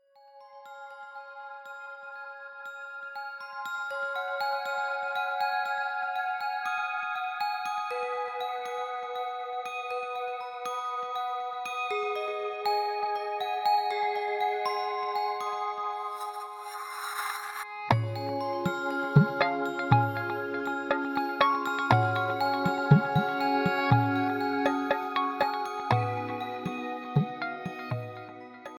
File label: sunny alarm ringtone; nokia